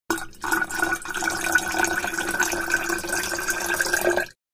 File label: liquid in a jar; water